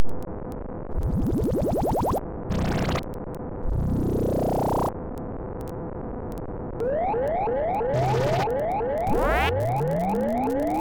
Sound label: Alien UFO Crashing